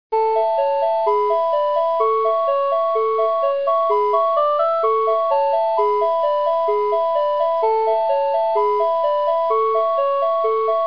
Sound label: Outside; Goldilocks Gamebook; Educational Demos